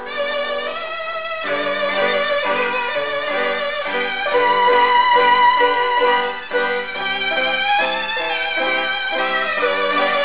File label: violano